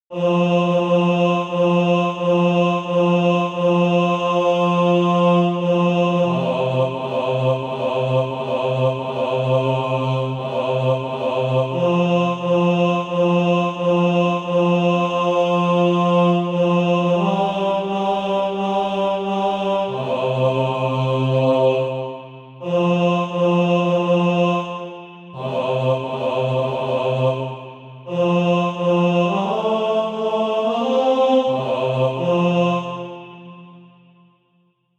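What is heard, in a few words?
bass, Practise Music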